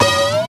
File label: OINNGGG